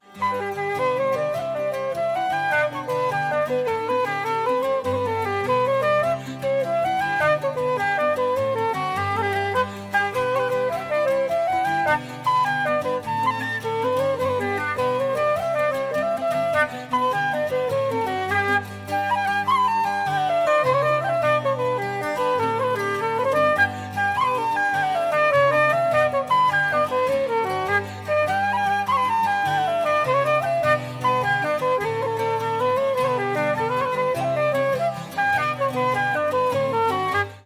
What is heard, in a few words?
jig